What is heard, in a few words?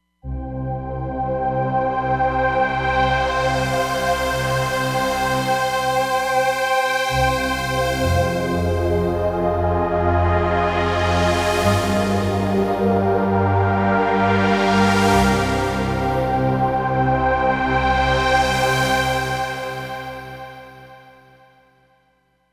Roland Fantom XR, Trns Sweep Pad